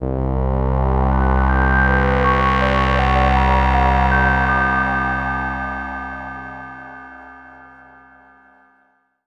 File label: M-Audio Venom Mysterio C2